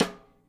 Side Stick Softest, hydrogen drum samples

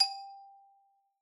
Xylo Hard G4 pp far, Hard Mallets; Idiophones; Struck Idiophones; Xylophone

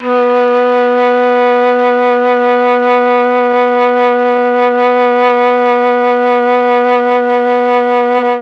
B1 TRON FLUT, mellotron; flute

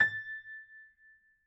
GPiano sus A5, Grand Piano, Kawai; Chordophones; Sustains

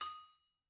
Anvil Hit, Struck Idiophones

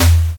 elec fuzz tom

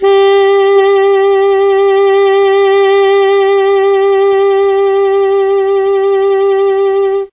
G2 alto sax, mellotron; CHMB ALTOSAX

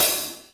Hat Semi Open Med; hydrogen drum samples

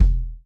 Kick Soft; hydrogen drum samples

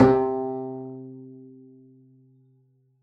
pizz c3, double bass; dsmolken

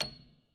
Upright Piano, Sustains, C7; Chordophones; Knight

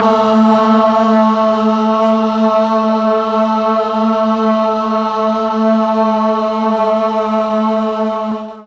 boys choir, mellotron

